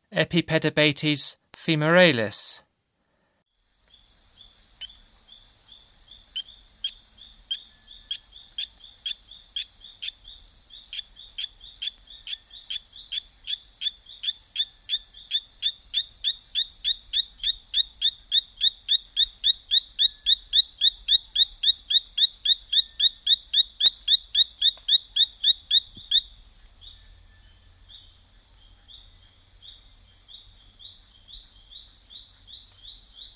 Epipedobates femoralis, Frogs of Ecuadorian Amazon; S. America